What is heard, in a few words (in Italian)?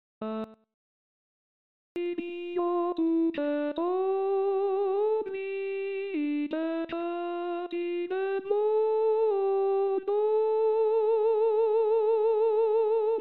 Agnello di Dio Coro